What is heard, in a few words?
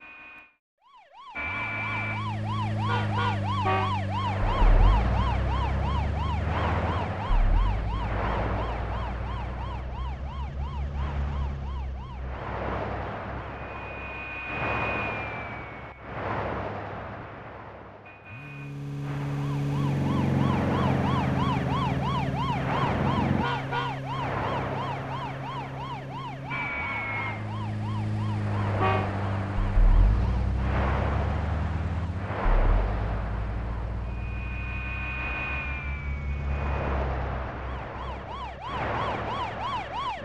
Deja Vu (Ambient); Majin Tensei 2; SNES